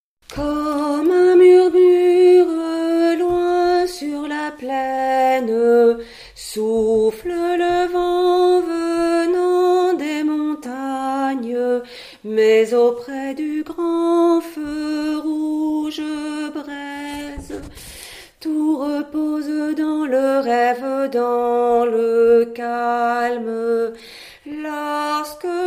Tabortuznel, Alto

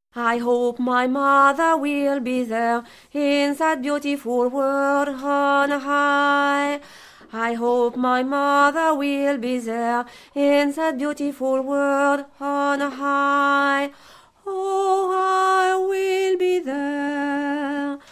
In That Beautiful World on High, Alto

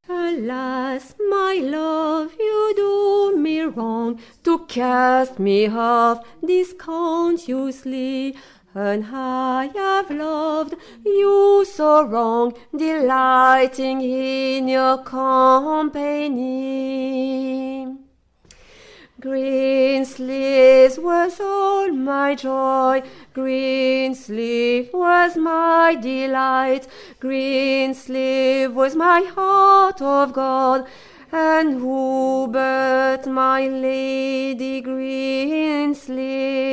Greensleeves, Alto

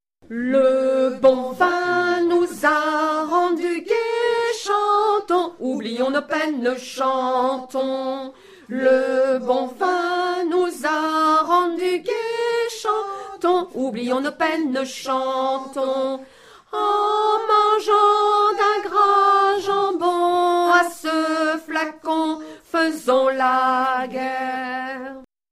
Tourdion, Alto

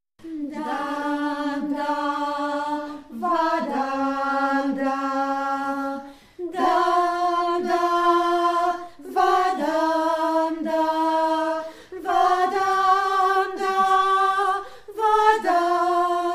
sarabande, Alto; sarabande 1

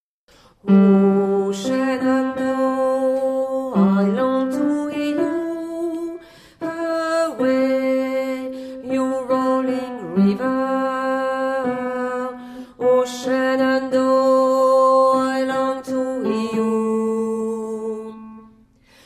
Shenandoah

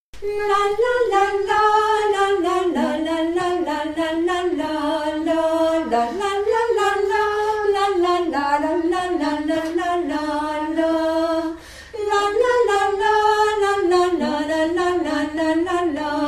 menuet du bourgeois gentilhomme, Alto

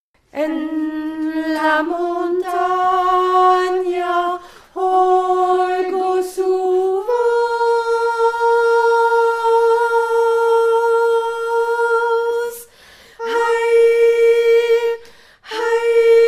El Eco, Alto

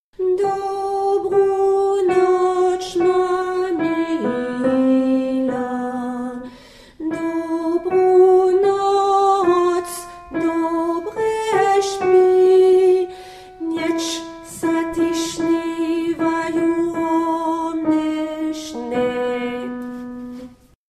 Dobru Noc, Alto

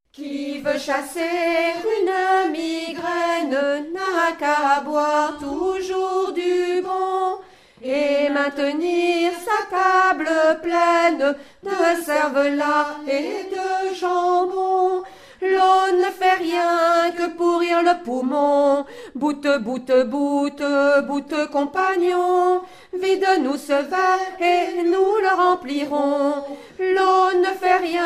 chanson a boire, Alto

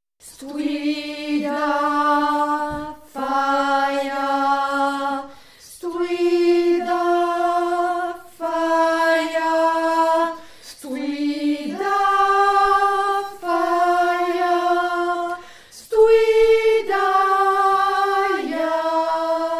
sarabande, Alto

